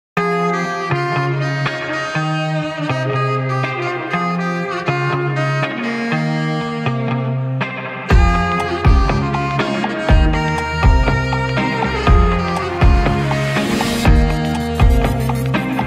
Saxophone

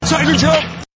Tiger Jump

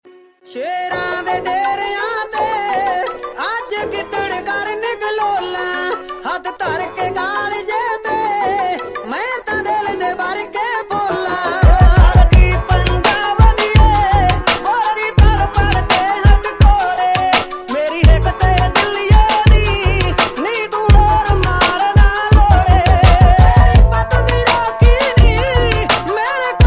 Punjabi

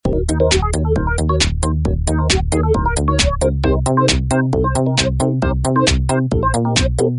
Disco Techno

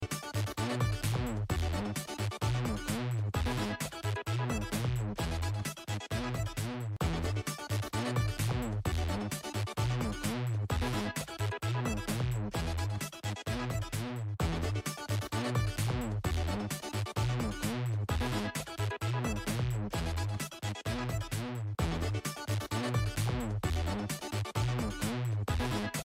Beats

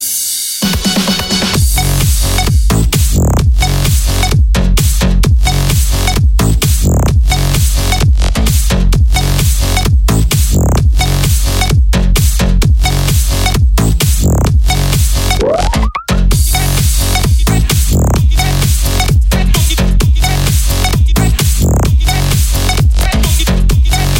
Fiddle; Creambase

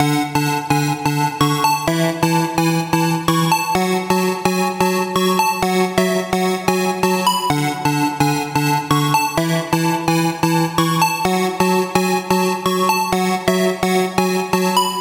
Epic Notification

